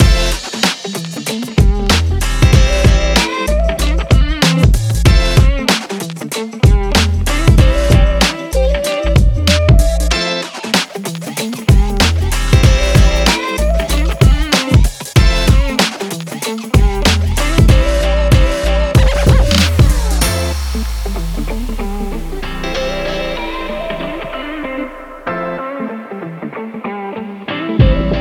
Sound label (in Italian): BASE MUSICALE HIP HOP